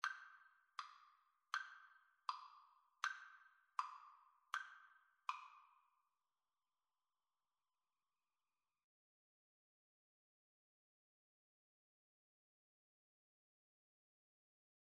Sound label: terciopelo, Violin Guitar Duet